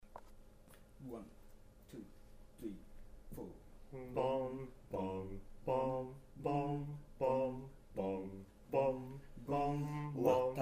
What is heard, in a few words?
Anthem